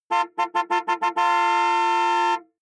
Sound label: car horn chopped exterior hummer h3